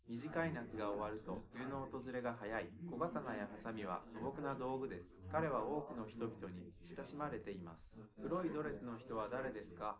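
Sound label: male, live recording 250ms 5cm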